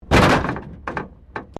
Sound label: Wreck Door Panel Clunk Metal